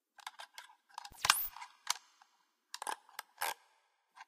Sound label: gadget; Secret Agent